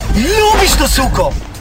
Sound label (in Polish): dzwonki SMS